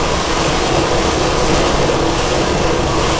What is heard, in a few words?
roll carpet, terrains; Tony Hawk's Project 8; XBOX